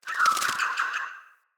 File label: flock bird attack dive; Characters; Enemy; Abilities; Sinecure; Ranged Hunter Thawtide